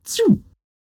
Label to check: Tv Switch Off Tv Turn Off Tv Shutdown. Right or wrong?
Right